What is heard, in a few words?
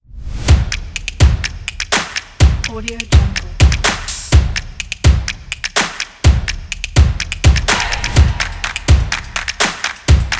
the stomp kit